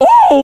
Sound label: "woo" female warped, voice; ooh ahh; ho hoo woo